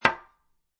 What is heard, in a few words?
Foley, Aerosol Can Set Down On Table; Objects